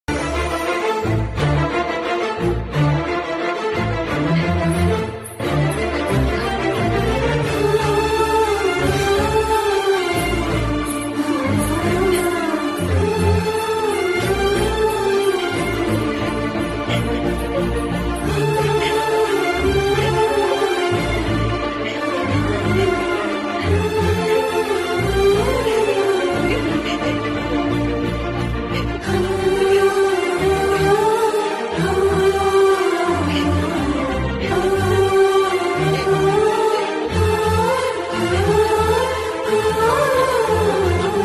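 emotional background music ringtone violin background music; Arya movie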